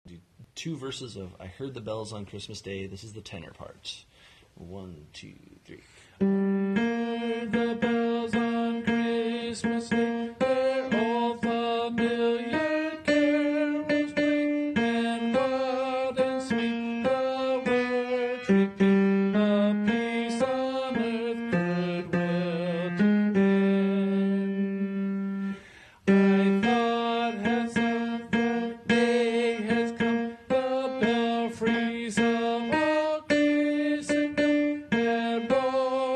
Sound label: I Heard The Bells On Christmas Day TENOR